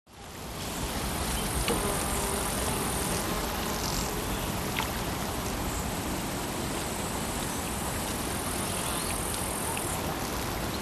moscow russia suburbs summer lake shore near village reeds rustling on wind light; water